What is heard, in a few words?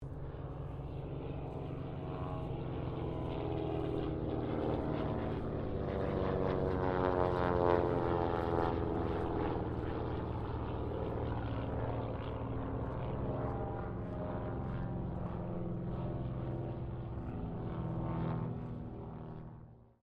AIRCRAFT BIRDDOG PAIR PASS DISTANT; Mixed aircraft squadrons; Airplanes (Sound Effects:Vehicles)